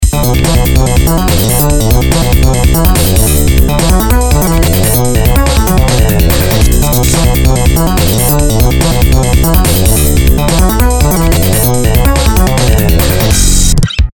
big base

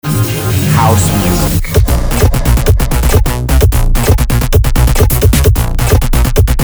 House music

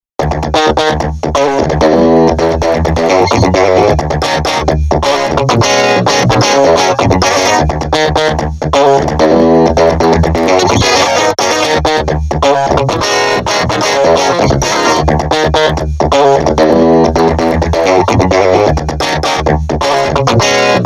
gtr wit comp + sm

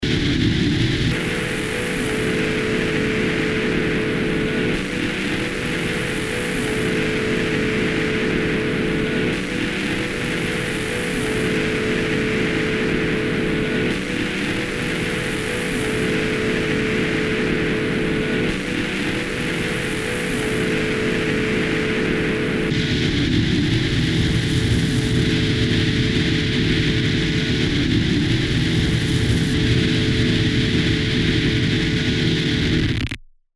gung loop

